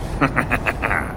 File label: laugh; freak fortress 2; armstrong